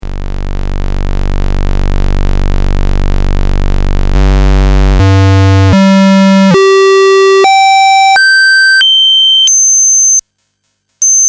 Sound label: Osc Rect